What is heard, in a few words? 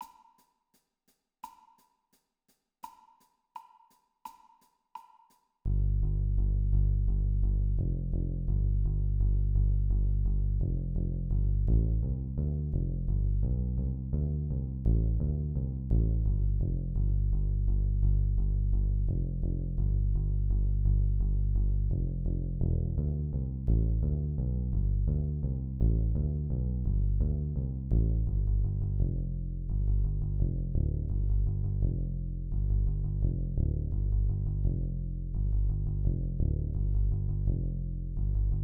Drum along 85